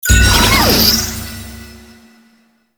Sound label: Nokko Mushroom Captured; Warframe; Nokko Colony; Mushroom Hunt